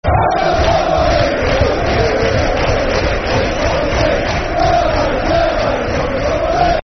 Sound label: E I E I E I O, Football Chants; Crystal Palace Cpfc